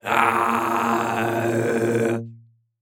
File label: Human Growl Through Tube